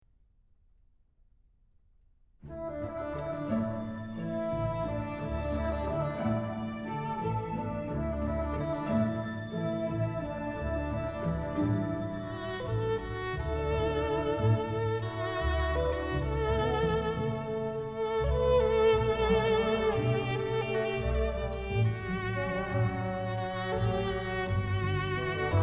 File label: theme instrumental; il postino